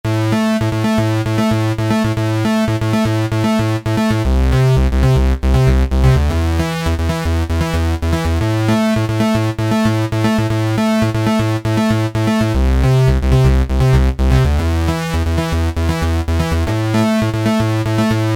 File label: Juno Pulse, E-mu Proteus VX